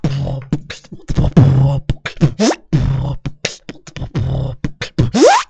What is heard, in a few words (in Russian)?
битбокс; мне кажится, что твой брр - это не элемент бочки, а другой звук, тоесть Б и просто рычание; Исправочка бита.; Brr-похож на басс